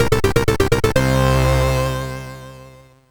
Race, sid, MOS6581R3

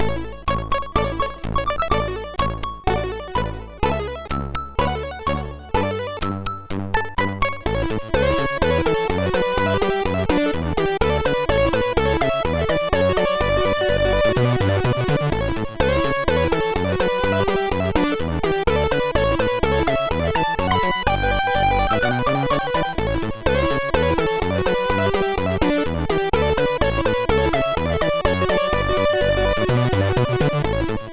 Fanfare, sid MOS6581R2

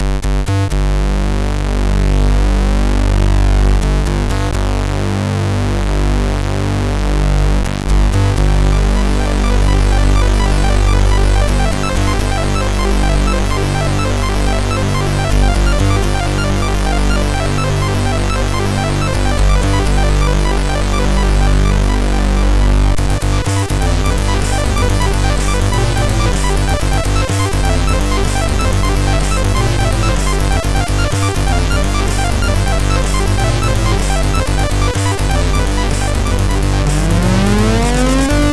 Moozak, MOS6581R3

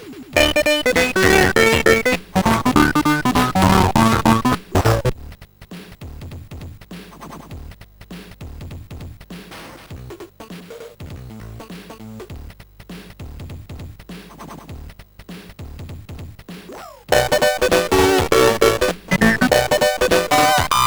Hip Hop, sid CSG8580R5

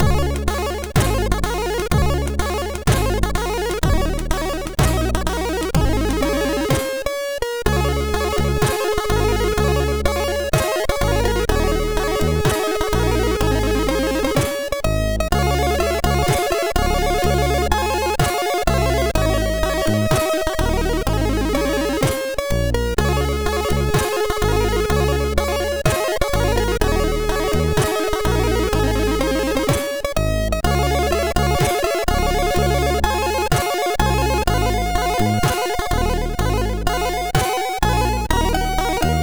MOS6581R2, sid

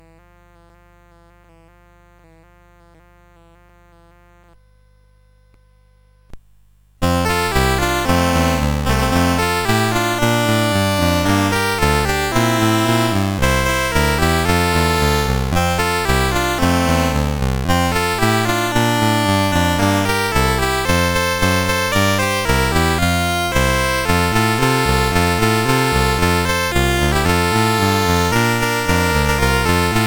Jingle Bells, CSG8580R5

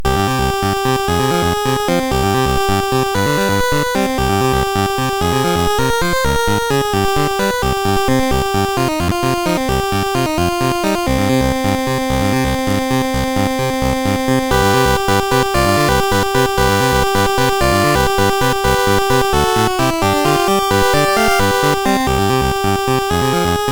Bed Room, sid MOS6581R4; hvsc

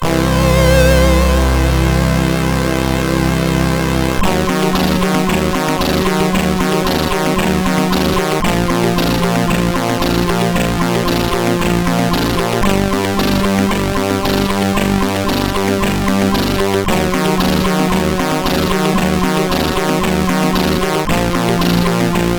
E-Load loader, CSG8580R5; hvsc

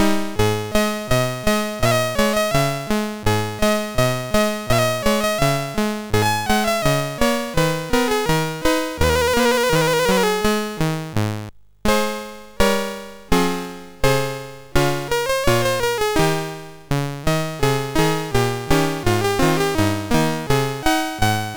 MOS6581R3, GAMES; Waterline

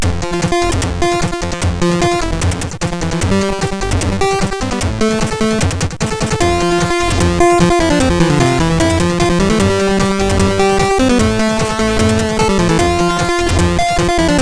sid MOS6581R2; Intoxicated; Dexter

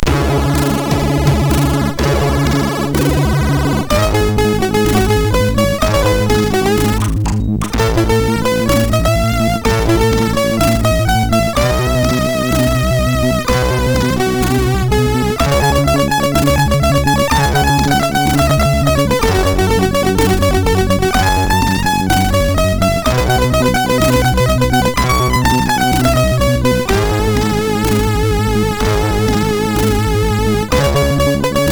Hawkeye loader, MOS6581R4; sid

